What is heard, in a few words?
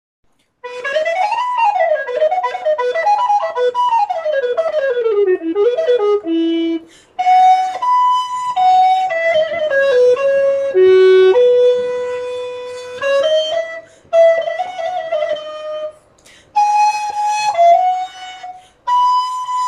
Sound sample moeck grenadilla, recorders